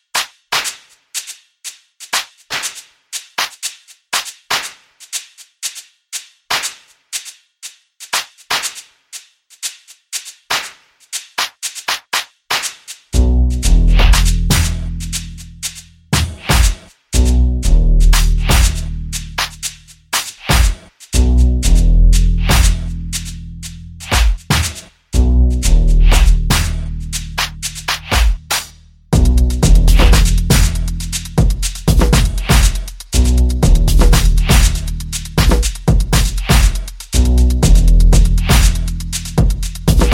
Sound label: Flute Strike